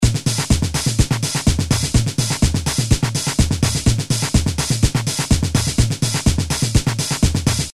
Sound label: Techno Samba, Korg DDM-110